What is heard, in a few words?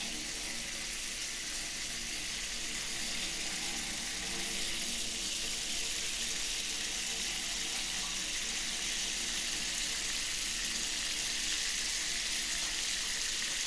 bath shower